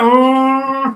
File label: weng